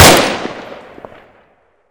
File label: rifle; SuperPower 2 Redux V7A; unit